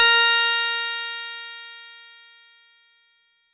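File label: Fuzz Clav DX100 A#1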